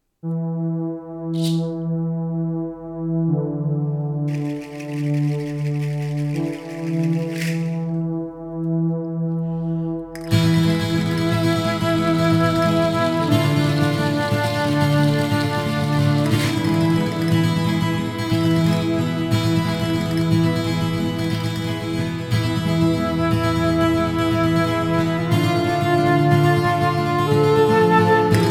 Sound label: Flamenco